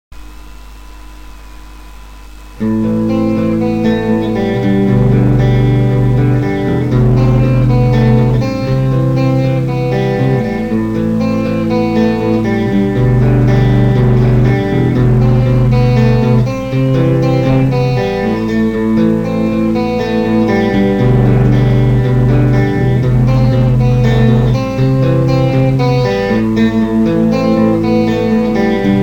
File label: Guitar clean fuzz